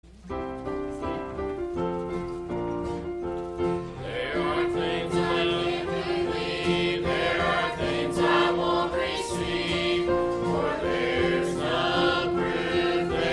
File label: Youth Choir